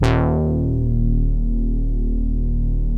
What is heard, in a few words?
BIT BRASS, Vintage Keyboards